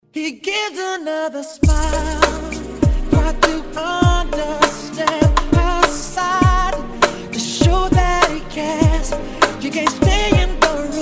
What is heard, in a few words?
Ballroom Rumba, 24-27 bpm